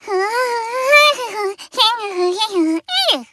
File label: Cook Kirby Humming; Kirby Dance